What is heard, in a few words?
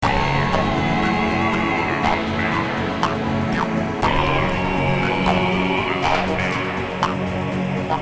acid mix tibet; Om Bhur Bhuva